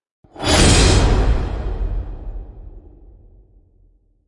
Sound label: Chemical Slam; Gothic Storm Music; Brain Warp (Sound Design Trailer Tools)